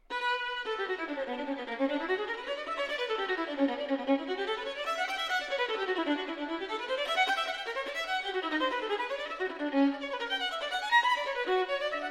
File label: violin partita in b minor double; violin partita no. 1; bwv 1002 iv